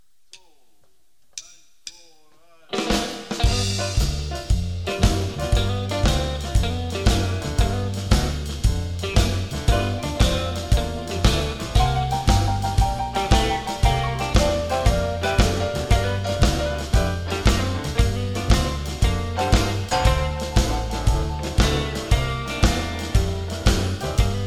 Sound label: Medium Offbeat Shuffle Theme 12 bar Piano Guitar Rhythm